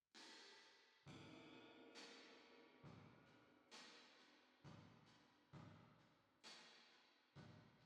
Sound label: Drums Verb